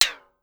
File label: Timbale Edge Down, Pitch Env; Clavia DDrum-AT; Pitch Env Various